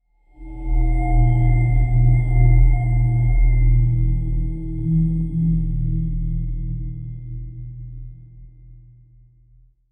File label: ancient stone resonance